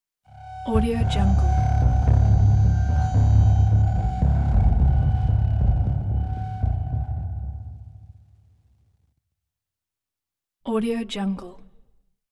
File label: suspenseful bass line; hollywoodedge